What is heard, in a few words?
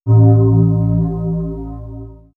Swirls, pads; X-Static Goldmine